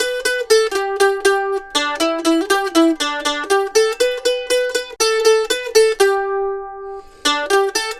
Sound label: Mandolin Lead; Continental Drift Aftershocks